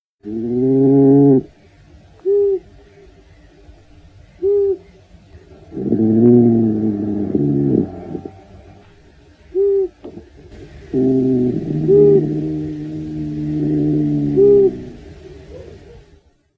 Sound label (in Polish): borsuk